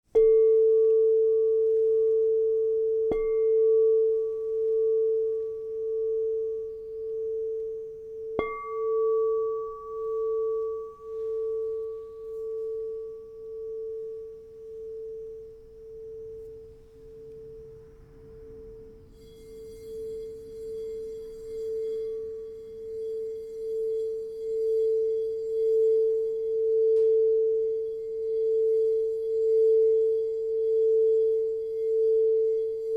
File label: Crystal Tones Singing Bowls